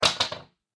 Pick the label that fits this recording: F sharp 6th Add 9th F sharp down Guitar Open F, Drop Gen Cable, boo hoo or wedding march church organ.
Drop Gen Cable